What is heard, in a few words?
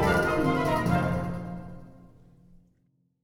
Music Menu 144bpm Stop